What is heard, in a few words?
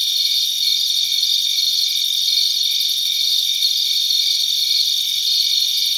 Night Amb